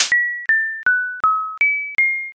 cracker

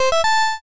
jingle good

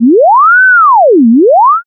police siren

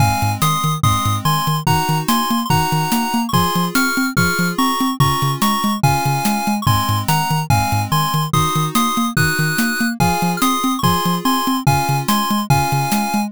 bgm street

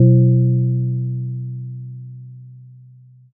gong

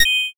judge perfect

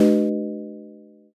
baan

